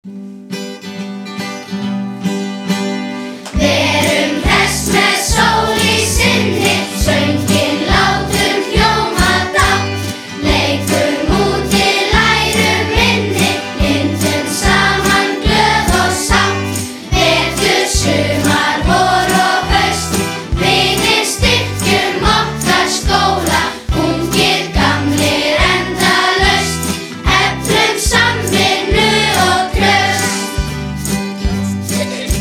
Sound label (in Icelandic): skolasöngur